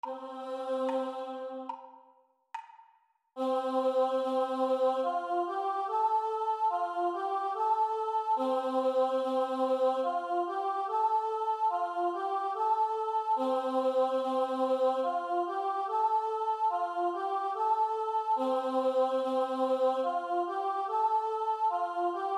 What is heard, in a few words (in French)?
Chant Vx Seule